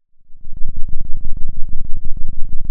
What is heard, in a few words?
pop, Sound of a thunderstorm